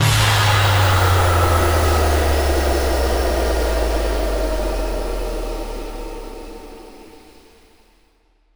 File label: Fall, Hits & Falls; Zero-G Trance Inducer